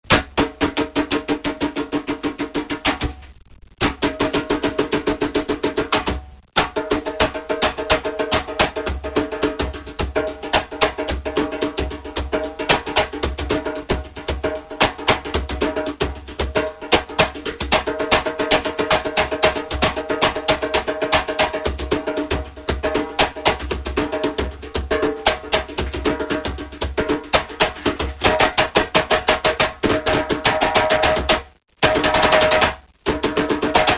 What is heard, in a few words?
djembe